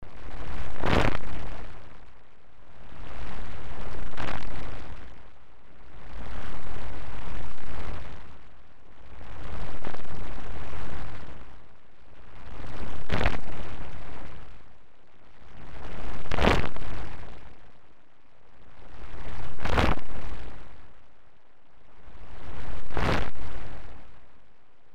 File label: electric waves slow loop